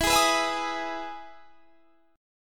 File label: E Major 7th E down; EM7 strummed